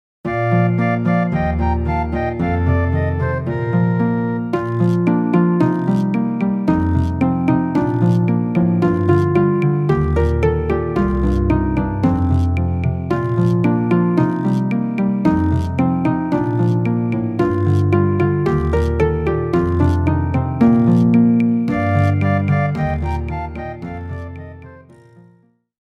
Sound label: Catch the Cats Music (instrumental); A Christmas Tail; christmas nativity plays; school plays